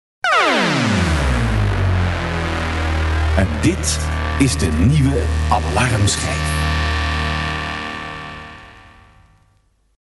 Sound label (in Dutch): nieuwe alarm schijf